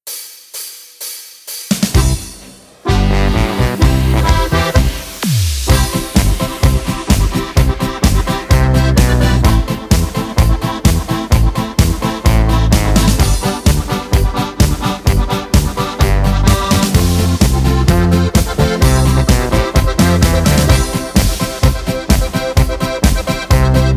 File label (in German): Hörprobe G C F B